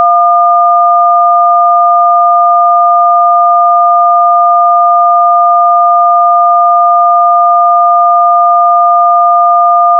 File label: DTMF 1 Ideal